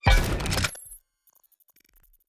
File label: ui relic; keides; Stellaris; paragon